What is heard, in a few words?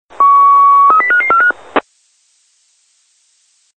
Police Radio